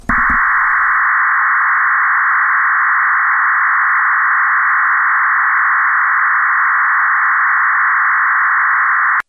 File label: FreeDV 700D; ham